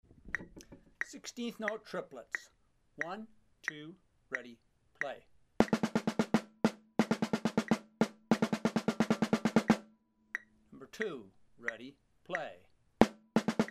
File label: sixteenth note triplets